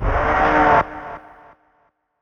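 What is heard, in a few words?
deep house FX, 125 bpm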